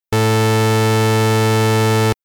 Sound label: Synth Additive Other Freq